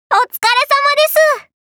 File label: applause; osu!; hvick-coogtb